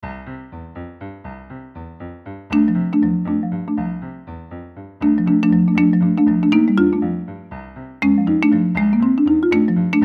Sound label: Boogie marimba